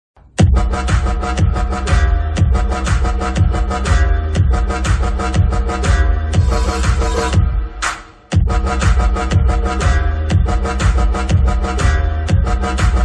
animal new mass bgm ringtone; ranbir kapoor new movie

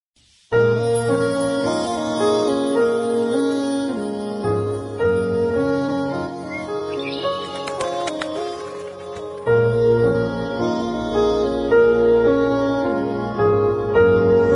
love feeling bgm ringtone flute bgm ringtone

